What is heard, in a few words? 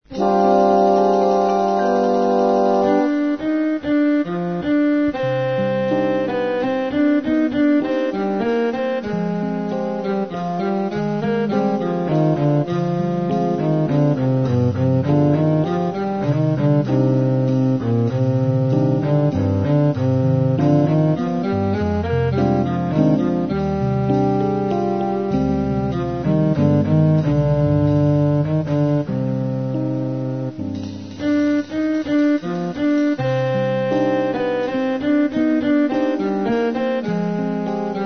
trombones